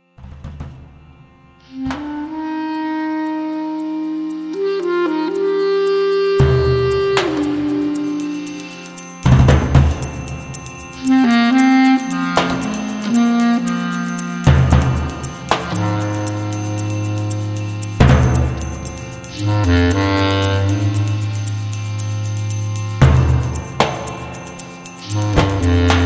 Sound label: trance